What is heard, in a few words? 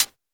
Classic hiphop hihat